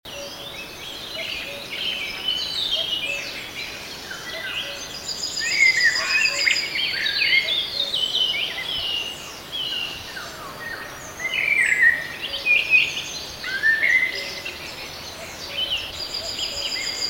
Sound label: morning ambience near meadows and forest loop; UltimateFishing2020